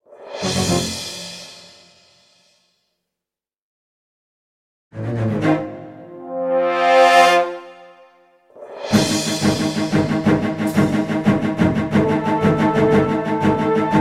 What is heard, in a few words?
Music; FMV